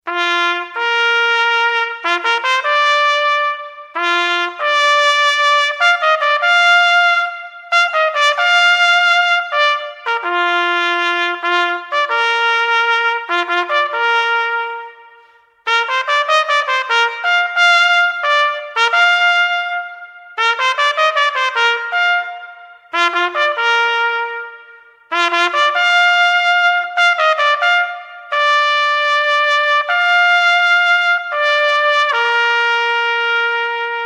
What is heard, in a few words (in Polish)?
hejnal